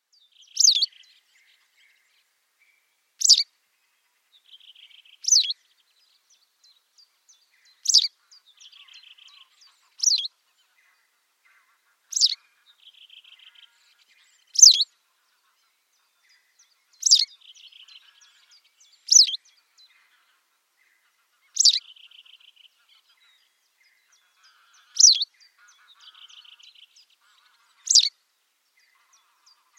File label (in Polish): pliszka siwa